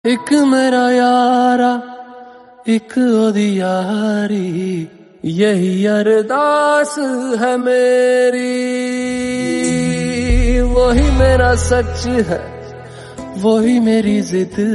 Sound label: very sad ring tone